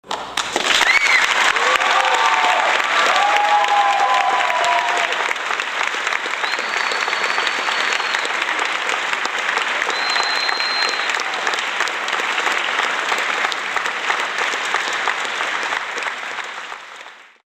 cheering appluase

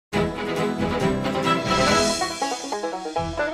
tombstone perk jingle; black ops 2 zombies

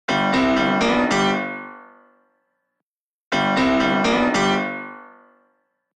iPhone Piano Riff Sound Effect

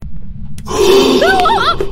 polka

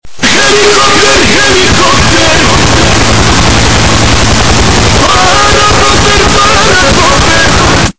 HELICOPTER

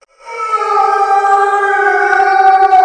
ooooaaaaahhhh; coach; l4d2 coach scream echo

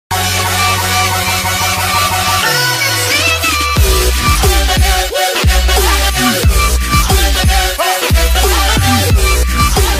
insane 10 second beat drop

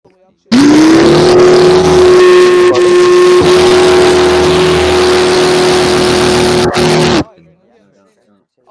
lawn mower mic

